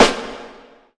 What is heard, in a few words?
full steel snare drum sample a sharp key